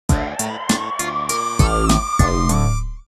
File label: Slot Spin Attract Stream; Slot Jumbo Sugar Daddy